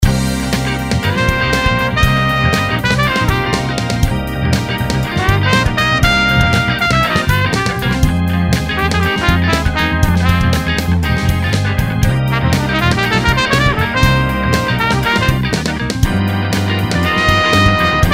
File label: Funk in C Solo Trumpet; Blues Solos